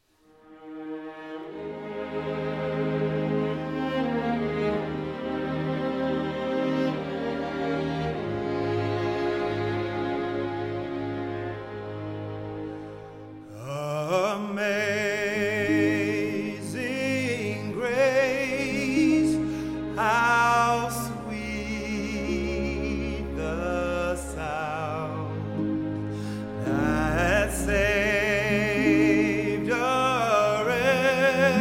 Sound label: Solo Violin, Voice, Harp, Piano; and String Orchestra; Amazing Grace; Traditional